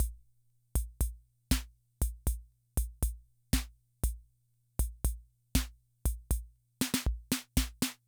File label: Loop, rhythm 55, classic machines